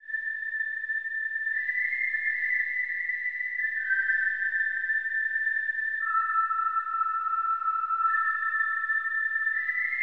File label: octave drone with Guzheng